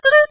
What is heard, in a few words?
musica maximize, windows 9x sound schemes